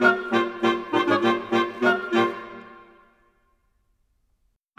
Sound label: Winds, Orchestral; Sonic Foundry (Sony Creative Software); Orchestral VOL-2; Modern 06